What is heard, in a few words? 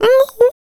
VOICE FX, Dance Vocals